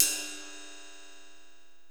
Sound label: ride, Percussion; realdrum